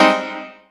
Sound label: house dit, Stabs; E Lab; XTc Files of House